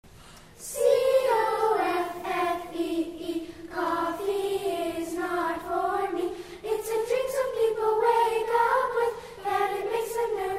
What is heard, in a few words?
Treble Choir Anthem